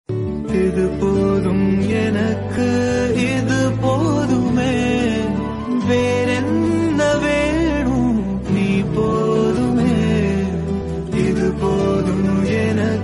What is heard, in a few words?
song romantic tamil; kadal